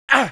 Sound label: damage, pain; mohaa; dialogue